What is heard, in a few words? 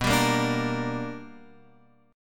B Minor Major 7th Sharp 5th